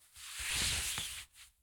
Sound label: Pants, Clothes